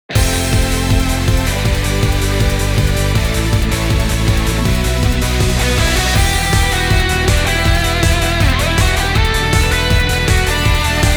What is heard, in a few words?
Battle impalpable loop; otome the exorcist